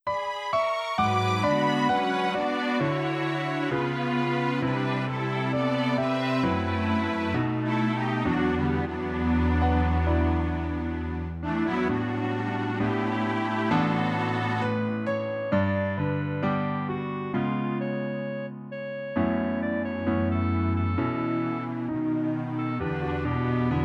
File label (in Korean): Piano Ver 멜로디MR